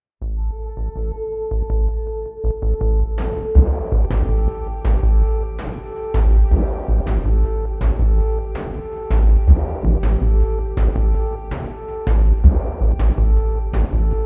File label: Rhythmic Dark, Intense, synth-pop modern pop